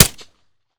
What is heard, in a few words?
fire sup, glock; arccw ud